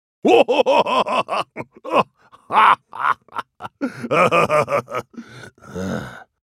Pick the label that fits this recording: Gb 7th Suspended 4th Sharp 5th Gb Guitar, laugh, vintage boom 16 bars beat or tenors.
laugh